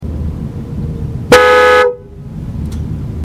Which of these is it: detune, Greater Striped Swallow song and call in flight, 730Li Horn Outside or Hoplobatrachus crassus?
730Li Horn Outside